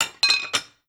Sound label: Enemy Glassy Common Appear